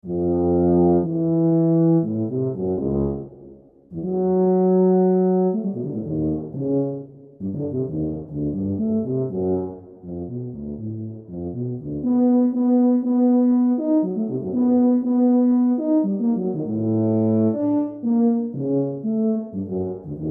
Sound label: Interval Study for Tuba